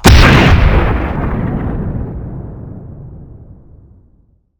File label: tiger cannon fire; WAR